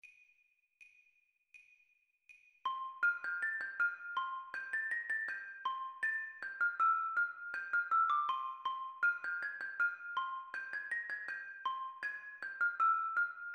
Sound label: mallets